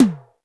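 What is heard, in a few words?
ELECTRIC tom mid; Greytsounds VOL-1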